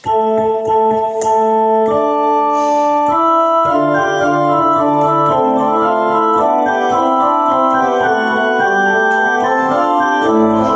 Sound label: Chorale Prelude; Erschienen ist der herrliche Tag; Buxtehude